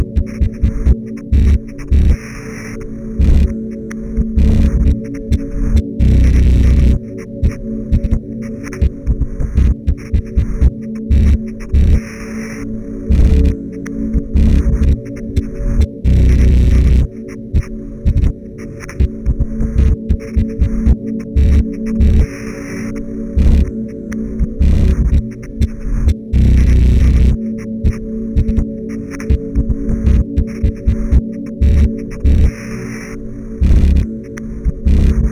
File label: Heavy Fuzz; Silent Hill Rebirth Original Soundtracks